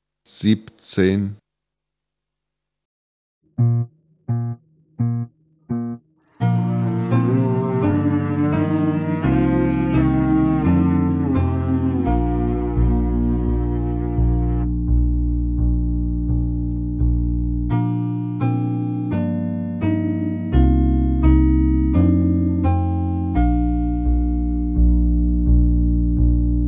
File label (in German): Klang, Hornschule